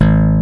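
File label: bass guitar G3, jazz bass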